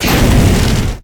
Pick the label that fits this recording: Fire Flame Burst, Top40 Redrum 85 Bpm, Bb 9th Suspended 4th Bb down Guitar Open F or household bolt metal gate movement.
Fire Flame Burst